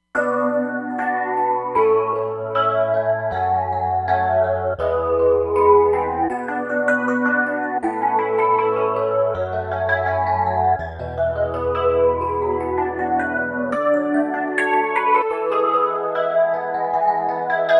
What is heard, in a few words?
Backing EP, Roland Fantom XR